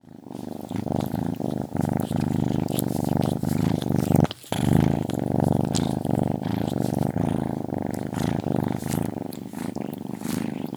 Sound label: Kitten Purrs and Licks; افکت صدا حیوانات; Animals